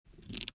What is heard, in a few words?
bow loading